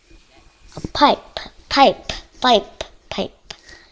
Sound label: pipe